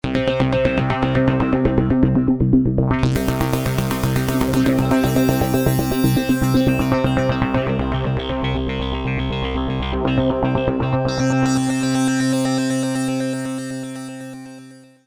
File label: Hi Lo Arp; V-Synth